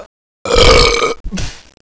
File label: burp